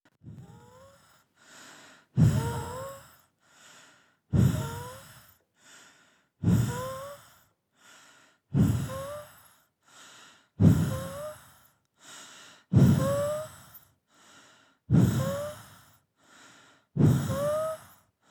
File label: Breathing sounds Θορυβώδης Noisy